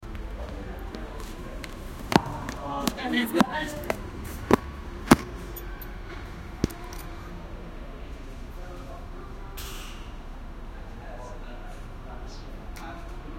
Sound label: sounds of people leaving; afternoon